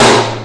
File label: TOM med; Hip Hop; STYLE KITS (Hip Hop, Etc); UK Hard Kit